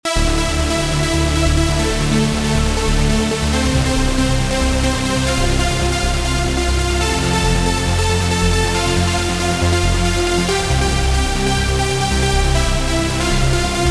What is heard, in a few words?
Lead Trance Serum